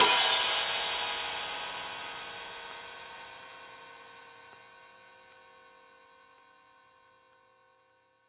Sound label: crash; eddyflux; dough-samples